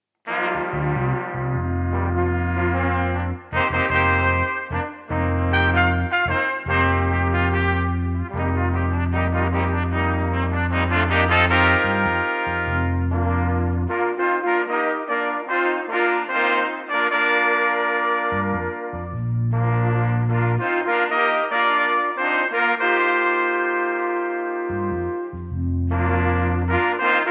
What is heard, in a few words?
Fanfare; Spirit of Brass; ostersonntag